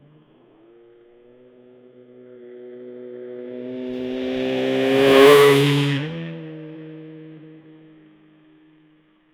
road par koi bike kisi, accident

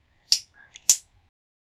puch marne ka sound, fighting

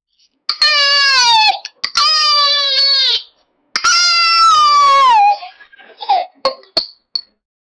koi lathi se bacche ko, violence

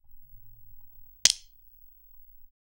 ek bacche ko chhad se, violence

